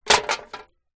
drink cans x2 empty down on concrete ground; foley

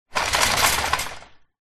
shopping cart metal push on concrete ground short; foley; zapsplat

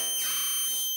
Scanner

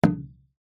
instrument drum gamelan hit single

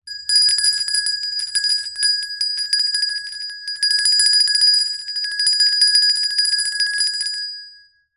bells small bell ring random fast

